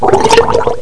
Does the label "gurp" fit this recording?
Yes